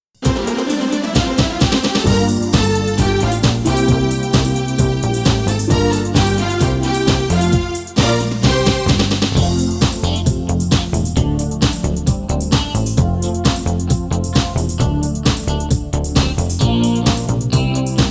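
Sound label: F live track; female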